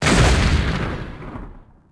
Explo Med; impacts explos

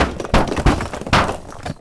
subway rocks on metal

